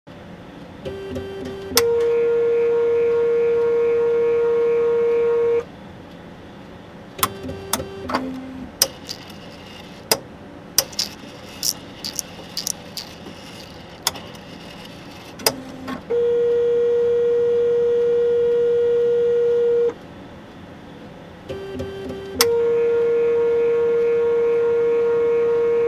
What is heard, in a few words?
Chemical Analyzer; Runs, Series Of Clicks, And Cycles, Beep Tones Hi / Low, Room Tone